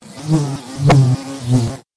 monster giant bee

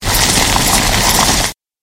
monster flea swarm

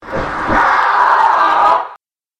monster fallen angel

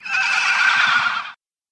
monster unicorn

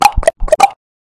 enchanting levelup

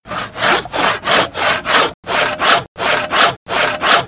tradeskill milling

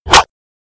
miss

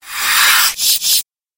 monster king cobra

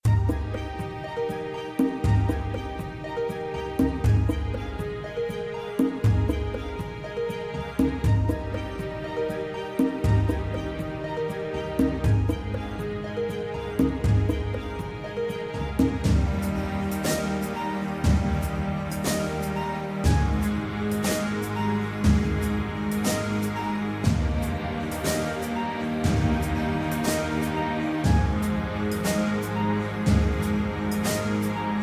wilderness calm dirt

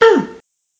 grunt female

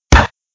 block

